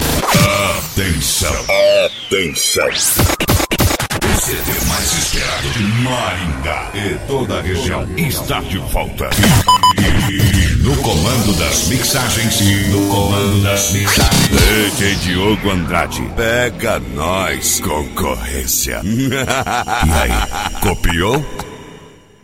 eletro funk

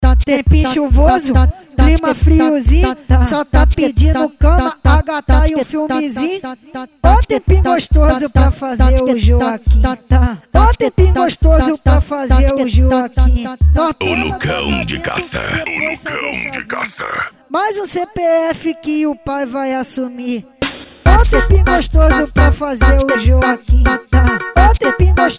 funk ritmado

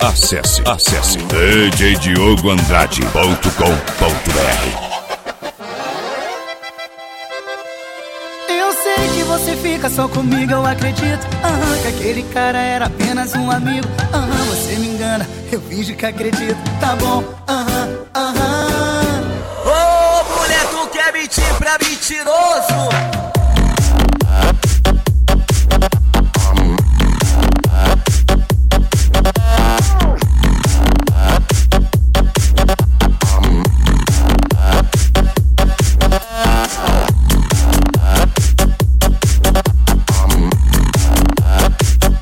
eletro funk

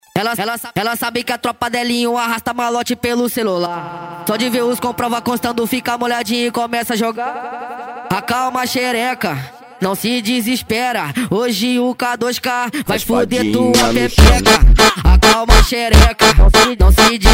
funk ritmado

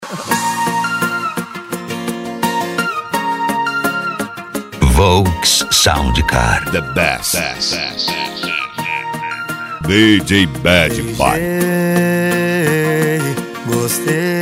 sertanejo e pisadinha; cd volks sound car

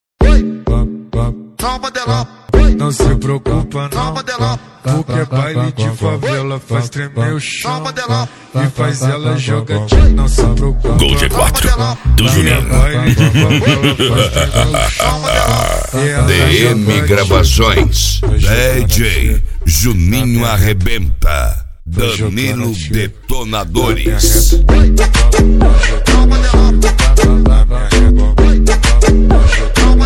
eletro funk

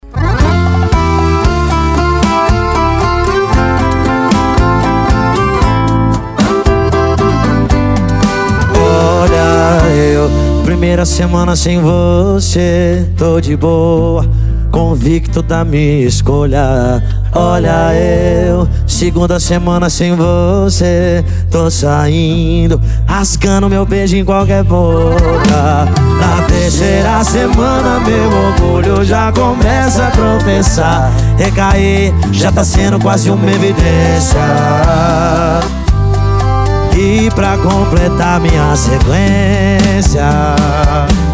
sertanejo

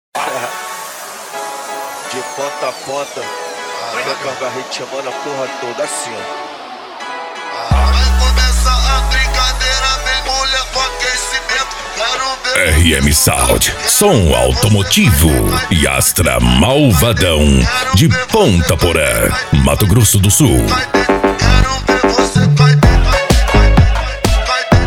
som automotivo

